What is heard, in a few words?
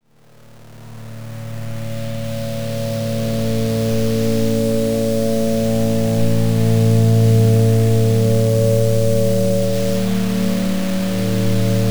White Fuzz, Drones; Sonic Foundry (Sony Creative Software)